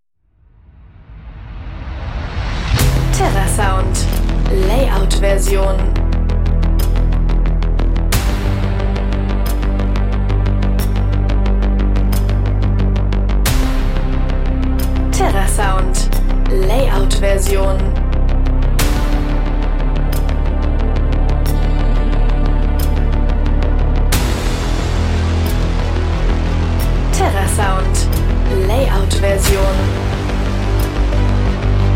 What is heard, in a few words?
epic scifi trailer track; terrasound